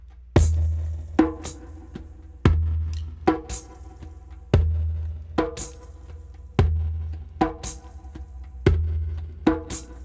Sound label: Shaman Beat